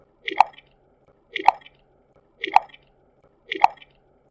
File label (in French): spiro retract